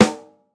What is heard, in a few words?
big room steel snare drum sample A key